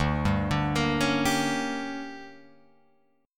D Major 7th Suspended 4th Sharp 5th, Guitar Open F; DM7sus4#5; arpeggiated; JGuitar